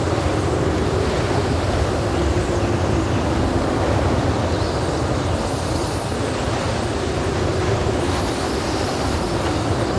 wind

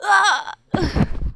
mob die; Champion; 야수맹; 홍작후

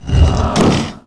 die; 패왕밀합

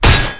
MOB BATTLE IRONLUMP, Battle Mob Weapon

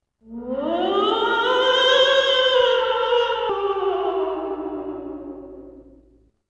ghost

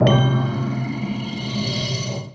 Learn Skill

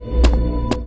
run; Monster; 레이드; 낭리도혁천조

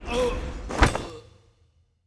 Mob die; Bossmob; 욱일승천류; 귀장 아베; 2단계

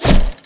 onslaught attack

